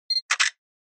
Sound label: Camera Compact Shutter